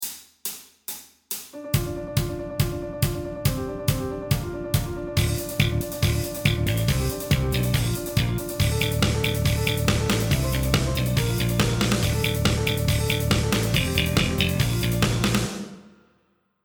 D Minor Damnation Backing Track Fast

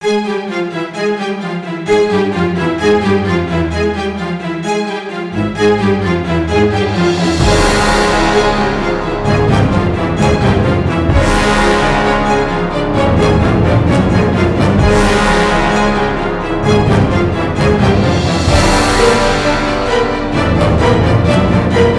Epic Drama

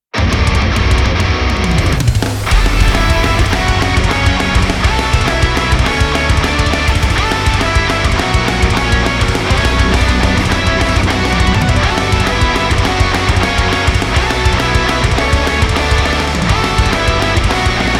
Instrumental; Savior; Astral Chain; Little V

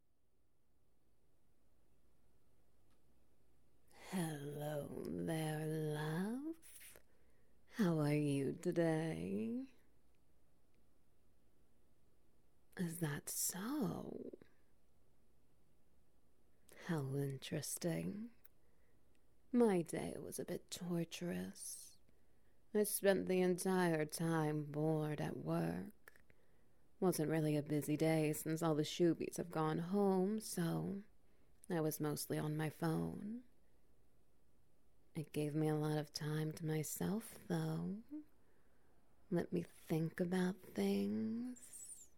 Background Noise Wet Noises

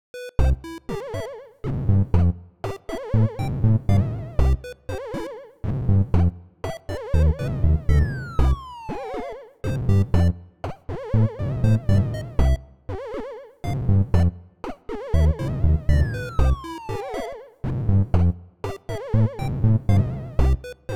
Jaunty Chiptune

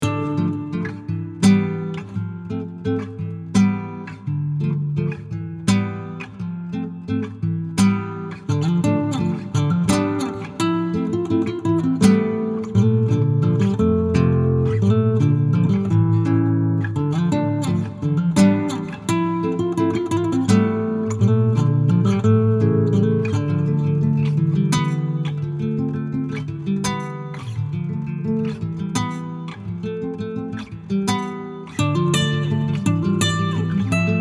Acoustic; Song of Storms

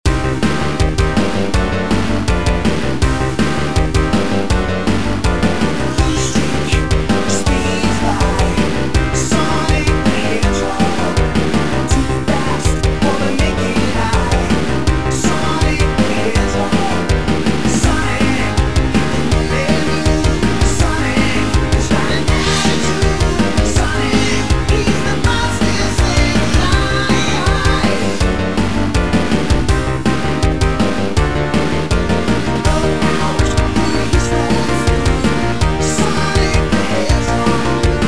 Sega Genesis Vocal Remix; Sonic SatAm Theme; SupremeMIDI

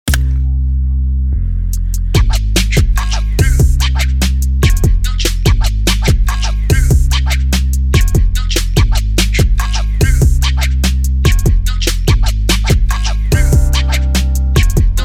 Instrumental

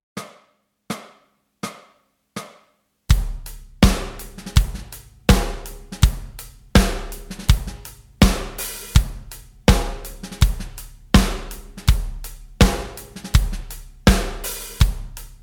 Backing Track; Mr Pink

